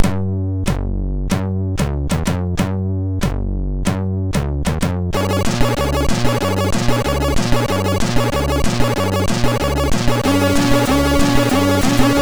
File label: Jumping Jack, MOS6581R2; Andy